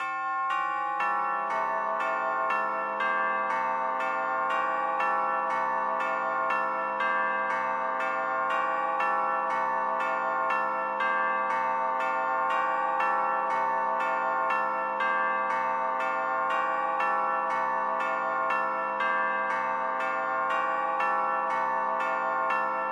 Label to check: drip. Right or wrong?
Wrong